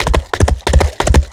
running; lighthouse pluton; lighthousemonster

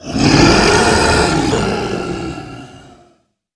croc scream

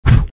puff; vo

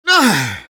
taunt exert; engy

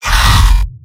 pain sharp; heavy; mvm

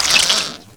item parasite drop

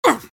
soldier pain sharp

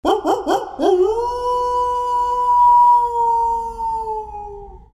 wolf howl; vo

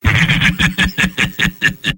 sniper laugh evil; vo; tf2; mvm

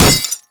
robo impact bullet; physics; Garry's Mod; tf2; mvm

